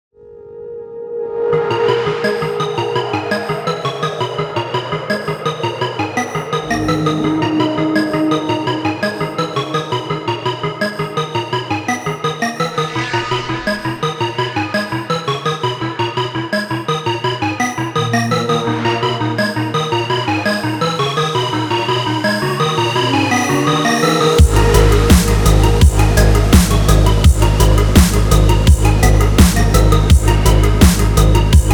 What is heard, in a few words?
Pure Analog Sweeps Toms